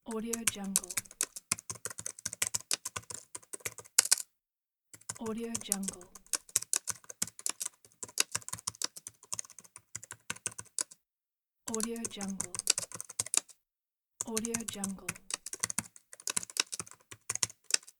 laptop typing; blackdragonfx

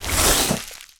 body tail weapon retract; monster within; expector; soundmorph

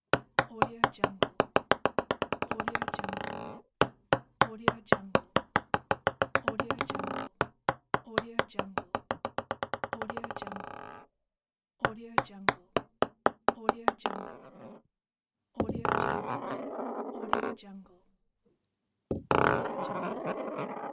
table tennis ball

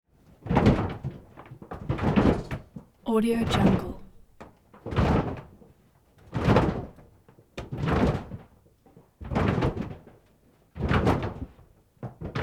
theater stomp beneath stage large group

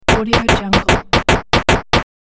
game ladder climb up

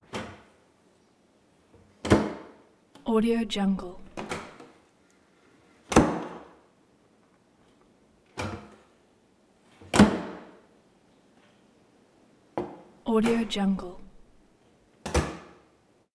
cabinet door

